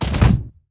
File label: Grounder Climb, Kraken; Evolve